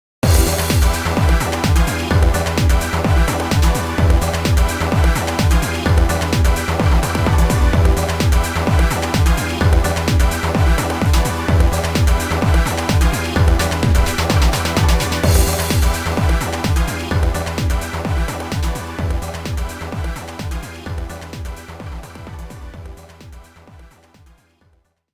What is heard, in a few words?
Duel Music; YuGiOh Over the Nexus